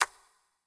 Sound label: Click